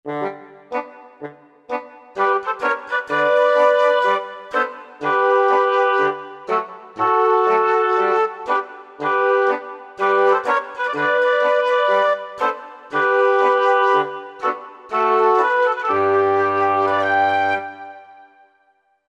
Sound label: Glory Woodz, Roland V-Synth; Factory Patches